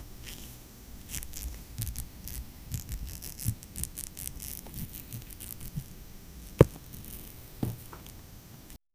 the sound of a character slipping on a banana peel